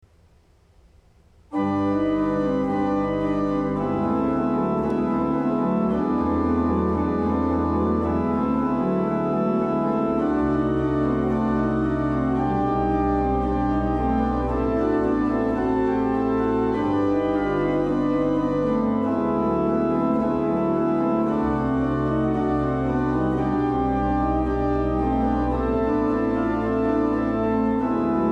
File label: Organ; Judas Betrayal